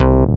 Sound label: Bass C, Offbeat Bass